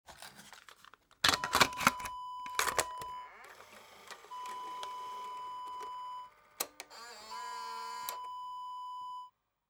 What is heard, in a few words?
camcorder tape insert